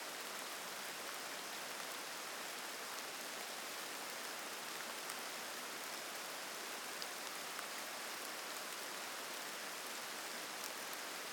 Rain Mixed Forest Dropping On Leaves And Gravel Path, Forests of Norway; Quad; Justsoundeffects